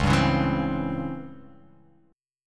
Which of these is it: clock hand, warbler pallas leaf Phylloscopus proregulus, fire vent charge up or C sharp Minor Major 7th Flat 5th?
C sharp Minor Major 7th Flat 5th